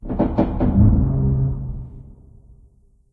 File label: metal randoms, ambience; source engine; portal2